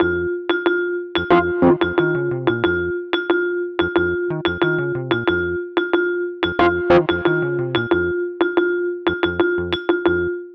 Vibes Rhodes Combo; Cinematic Funk; Sonic Foundry (Sony Creative Software); Cinematic Funk Urban Tone Poems

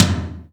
TOM, Drum Dry; East Collexion; Drum 1

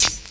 HH, HIHAT HIP, Dance Mega Drums; Best Service

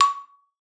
C#5, xylophone; Xsample VOL-4

